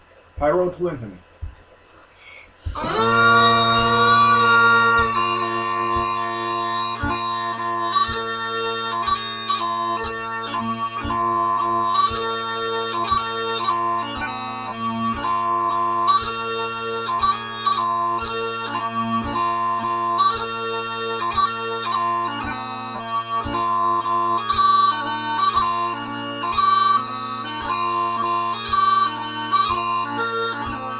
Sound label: High Road to Linton Kitchen Pipes